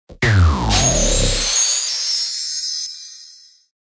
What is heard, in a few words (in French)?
AMBIANCE; pack noel; SOUND-DESIGNS